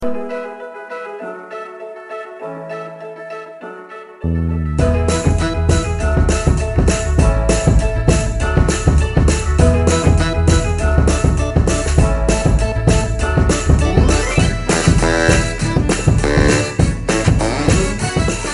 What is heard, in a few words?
Theme Instrumental